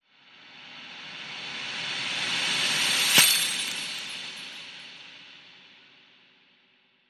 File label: Sleigh Bell Reverse; Santa